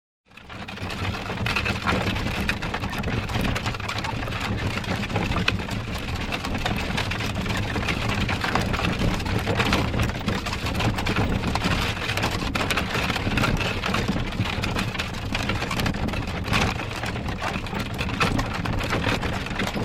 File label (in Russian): kareta telega katitsya